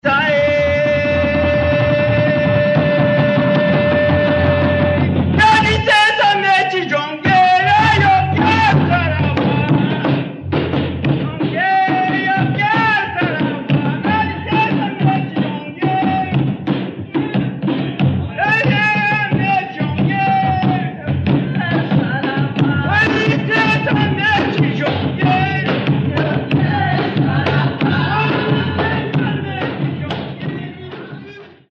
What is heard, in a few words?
jongo